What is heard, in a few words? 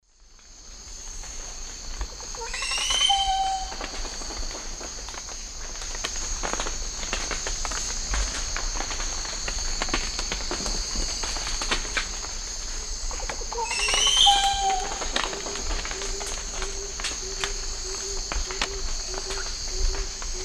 Psarocolius decumanus, display song; S. America; Eastern Lowlands